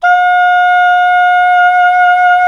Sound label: SAX SOP MF, Soprano Long